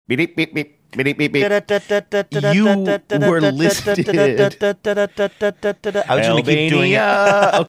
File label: making teletype sounds singing; albania